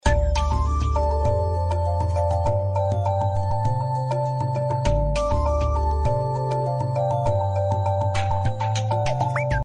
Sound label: megawin loop